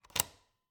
Cabin, front door, deadbolt, lock, latch, thick, close, strong, hyper; Eiravaein Works; Vaeyan IV